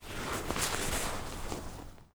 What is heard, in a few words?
MOVEMENT Snow; don t be afraid the first toy